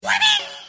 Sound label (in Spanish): grito